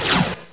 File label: Rapid Fire Second Shot; rustbit